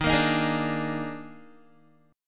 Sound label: Eb Major 7th Suspended 4th Eb, Guitar; EbM7sus4 strummed